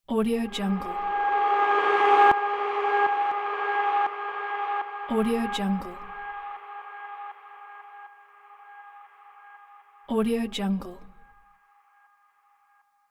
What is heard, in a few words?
transition airy cinematic ultra long; transitions and movement